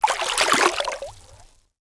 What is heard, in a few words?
water footstep; metro